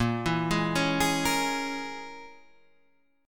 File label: Bb Minor 7th Flat 5th, guitar